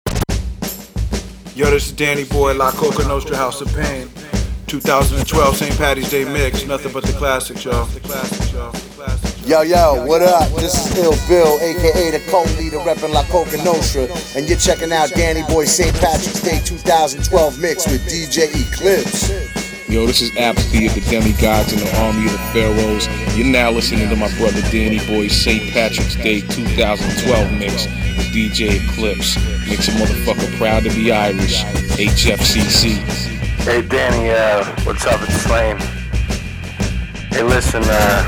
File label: bagpipes intro drops